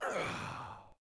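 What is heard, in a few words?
Swordsman Heal Vox; Civ4 warlords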